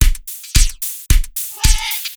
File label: house